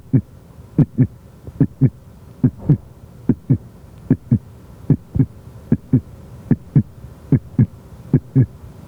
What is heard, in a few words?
fake heartbeat